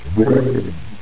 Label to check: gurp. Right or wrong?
Right